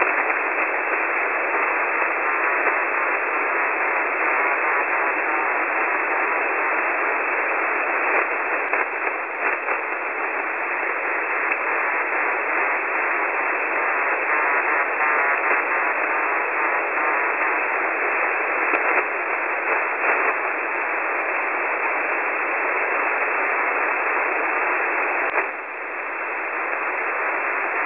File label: websdr recording 9563.5kHz